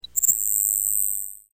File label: Grasshopper Sparrow